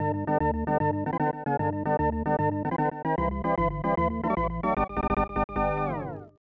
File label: Fanfare ~ Drama; super bases loaded 3; snes